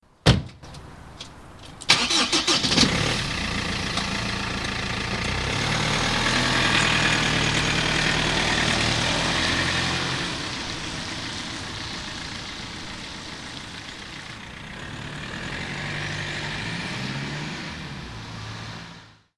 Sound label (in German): Auto; geraeuschebingo